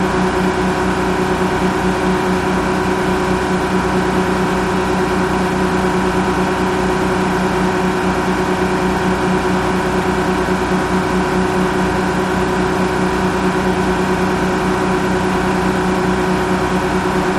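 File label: Diesel Engine Constant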